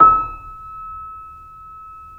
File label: C7 PP, The Ultimate Piano Collection; East West